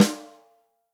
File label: ROCK SNARE CHROMATIC, Real Drum Kits